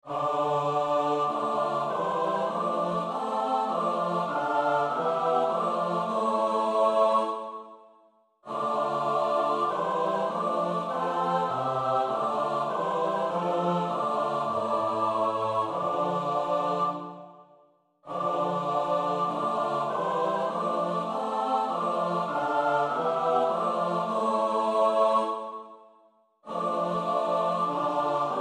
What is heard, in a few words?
Psalm 2 vers 2 en 4 (S-A-T-B)